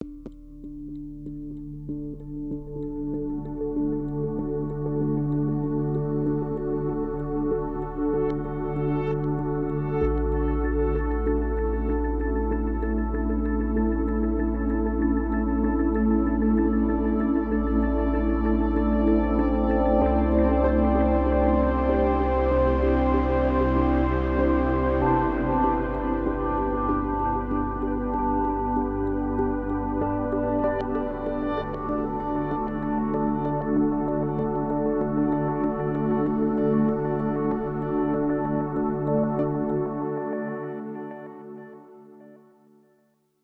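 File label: Music Electronic, Electronic Post Race 96bpm; Forza Motorsport 6 Apex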